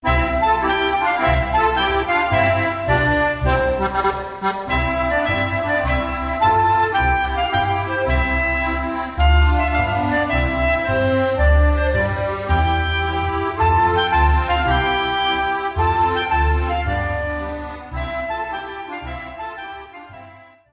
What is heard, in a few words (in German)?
WINDISCHER MOSCHENDORF, Autriche